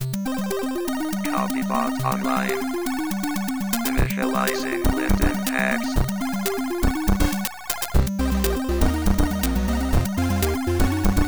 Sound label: chiptune mono; libomv